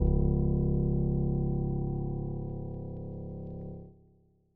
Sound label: KEYS Dark Piano; thermal mulitkit 2024